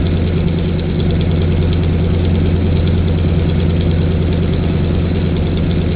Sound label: P47 Internal, Aircraft; combatfs